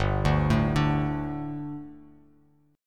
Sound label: G Suspended 2nd G, down, Bass Standard; Gsus2 arpeggiated; JGuitar